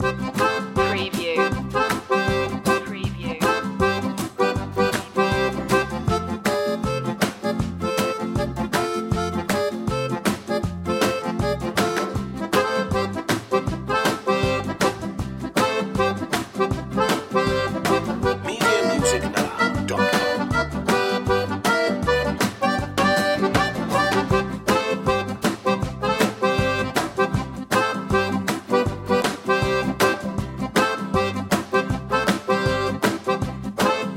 zydeco loop

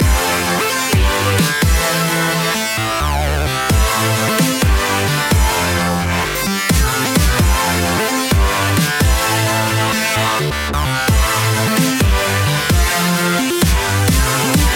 flying chords loop

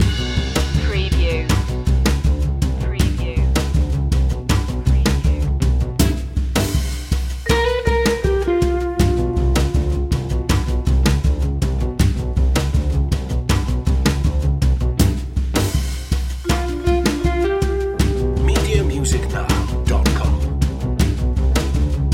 fast and jazz main loop

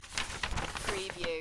paper movement

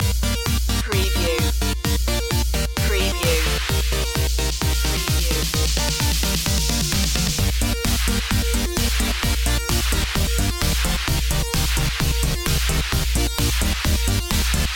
retro platform jumper loop

